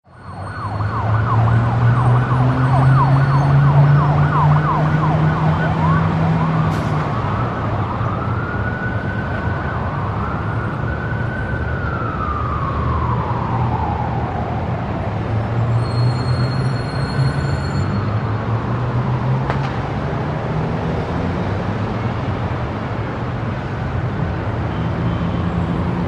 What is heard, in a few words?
Ambience: Medium City Traffic with Police Siren And Multiple Car Bys.